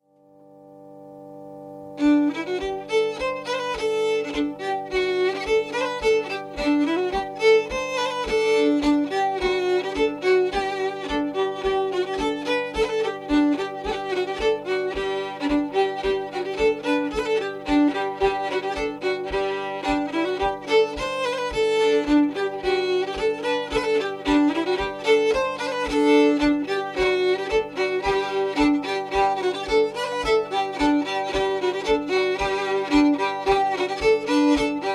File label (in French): La guimbarde